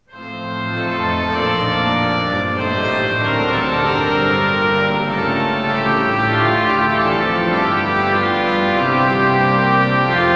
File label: choir